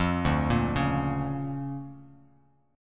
D Minor Major 7th Sharp 5th down Bass Standard, chord; DmM7#5 arpeggiated; JGuitar